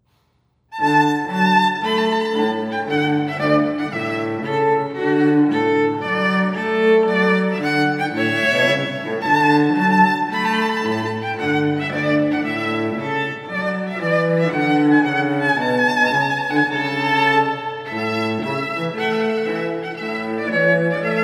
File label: Trumpet Tune; Purcell